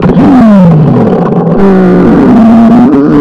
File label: boosting turbo engine rev ups